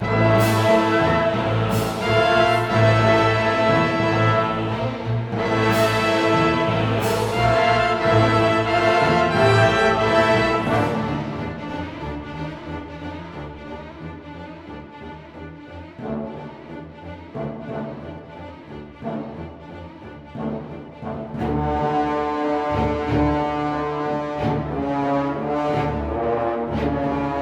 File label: Music Combat Boss Agile Phase